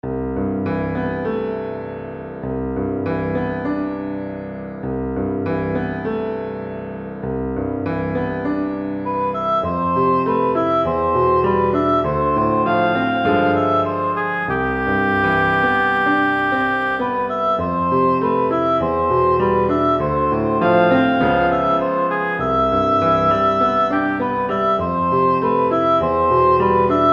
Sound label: oboe